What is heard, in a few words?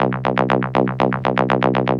Techno Synth; Sonic Foundry (Sony Creative Software); Trance NRG VOL-2; Techno Synths